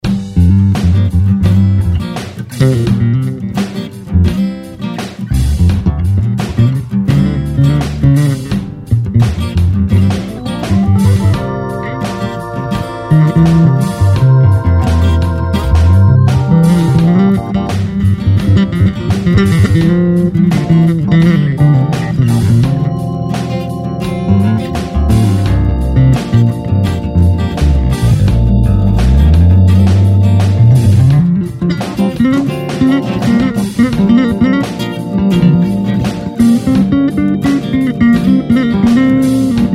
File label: Blues In G 85 Solo Bass; Blues Solos; Santana Style